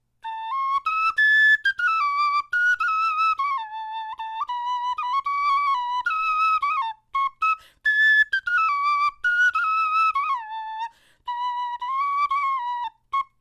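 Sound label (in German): melodie, Knochenflöten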